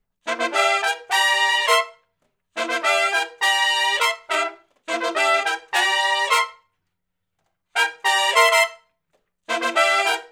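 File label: R&B Riff (C) harmony, Horncraft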